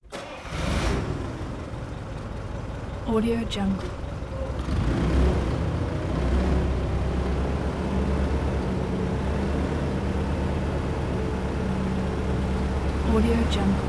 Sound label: forklift medium in